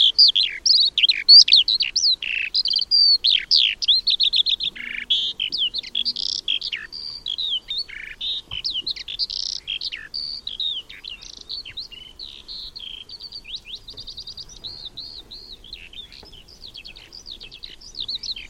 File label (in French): alouette des champs; chant